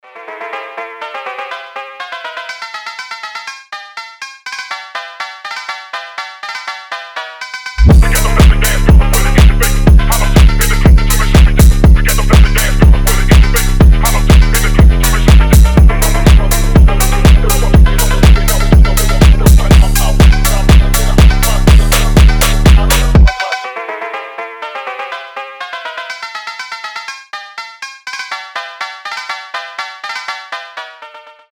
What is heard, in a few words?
classical phonk house